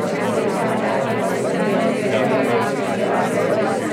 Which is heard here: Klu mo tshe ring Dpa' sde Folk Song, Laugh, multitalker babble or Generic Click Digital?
multitalker babble